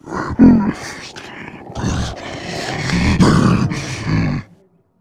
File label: alert, male zombie; nmrih; zerosiki; slender